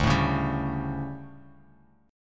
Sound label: D-Major 7th Suspended 4th, D, Guitar, Open F; strummed; 2,4,1,2,x,x; chord